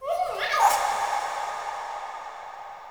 Day Pixies, Ambience; Glades Of Andurin

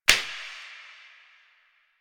Plastic Broom Drop; Warframe; Nineteen Ninety Nine

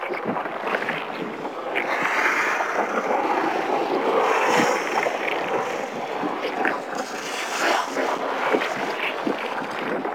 Interior Caves Vein Loop; Warframe; Infested Eris; Ambience; Props Indoor

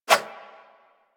Spheres Throw Whoosh

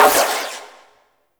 Shot 2D Fire, Weapons Hand Shotgun; Prime Pyrana

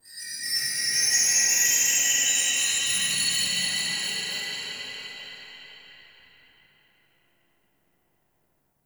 Root Cave Magical Chimes; Ambience; One Offs; Neathuns Root Cave

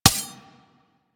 Foot Stab; Enemies; Abilities; Warframe; Corpus; Arachnoid Hunger

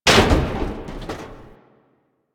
Military Checkpoint Wall Destroy; Warframe; Nineteen Ninety Nine

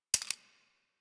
Dual Soma Mech 2D B

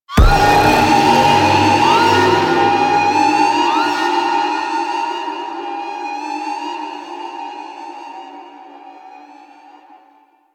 Mech Survival Alarm; Sentient

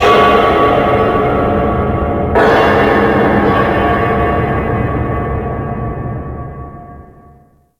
Pipe Drop; Ambience; Warframe; Grineer Galleon

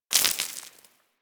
Bullet Impact Debris Dirt; Warframe; Weapons

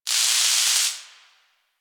Destruction Electricity Sparks; Ambience; Warframe; Corpus Railjack

